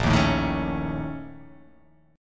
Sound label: A sharp Minor Major 7th Double Flat 5th A sharp